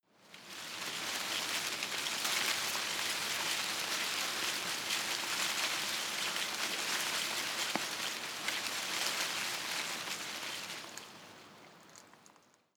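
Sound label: tree small leaves rustle soft, garten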